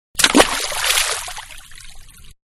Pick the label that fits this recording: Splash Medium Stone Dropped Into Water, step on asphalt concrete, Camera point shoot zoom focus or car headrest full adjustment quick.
Splash Medium Stone Dropped Into Water